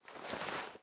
weapon movement; weapons

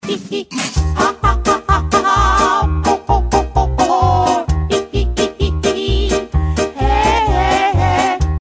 haha hihi; spongebob; phx new taunts pack; props taunts new

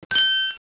cling; prop hunt x originals

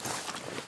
weapon movement walk; weapons

